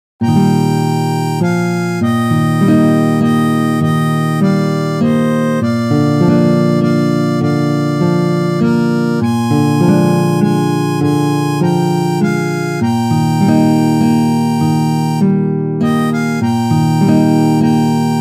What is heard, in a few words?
Harmonica; In The Garden